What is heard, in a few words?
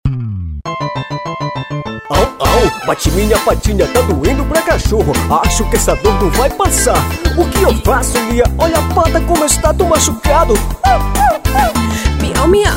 jingle